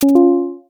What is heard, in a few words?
chat notice